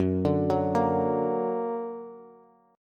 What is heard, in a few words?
Gb Augmented Bass; Gb+ arpeggiated